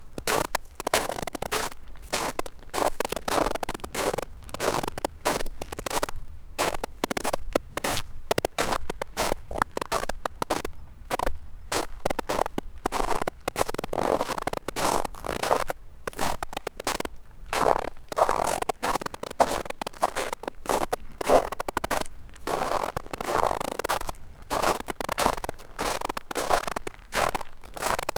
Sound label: Footsteps in Snow; Christmas